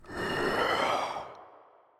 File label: fear; Illusion UE5